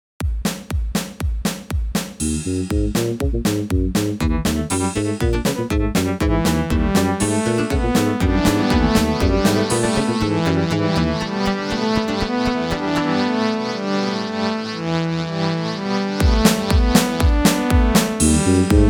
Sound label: rock